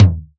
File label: Tom; Drums